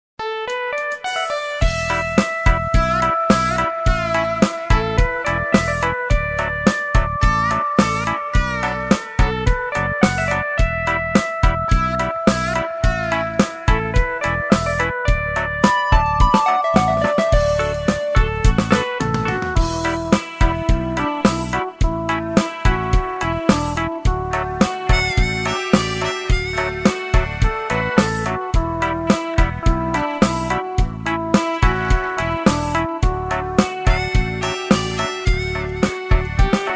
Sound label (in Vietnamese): nhạc chuông Indonesia không lời